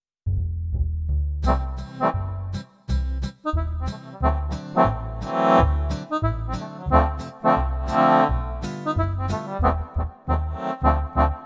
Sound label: Sound module basson; SONATTER TM4